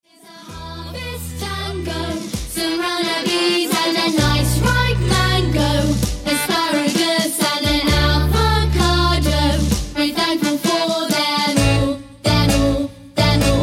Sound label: Harvest Tango